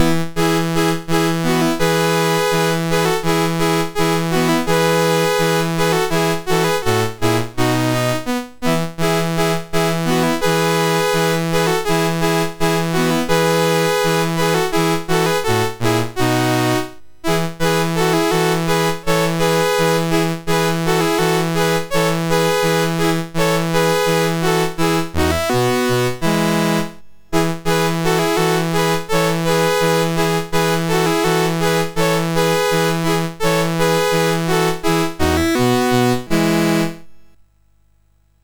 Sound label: MOS6581R3, GAMES; Woodpecker